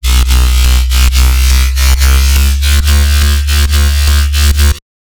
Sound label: Bassline, Dubstep and Trap